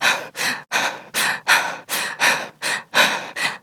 Breath Heavy Fast Adult; New War; Adult Operator

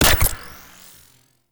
UI Allocation Start; Warframe; Railjack; Player Ship

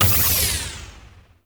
Ammo Efficiency Ability End; Warframe; Warframes; Helminth Abilities

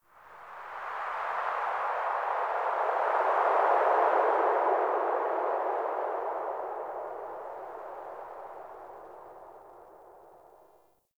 Dark Wind; Warframe; Tau

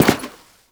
Step Default; Warframe; The New War; Characters; Veso

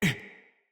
Pain, Battle Barks; Envoy

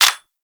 Fire Stop Mech, Weapons; Warframe; Tenno; Protea; Velox Prime; SMG

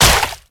Step Water, Infested; Warframe; Zealoid Prelate

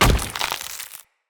Acorn Impact Dirt; Enemy; Characters; Abilities; Mendicant; Mnd Wazard

